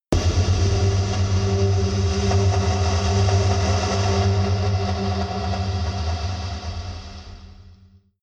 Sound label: noise impact rumble; impacts; hits-explosionen